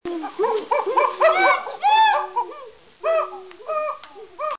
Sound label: chimps